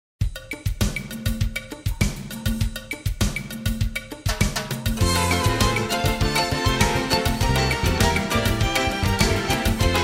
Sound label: Jingle Bells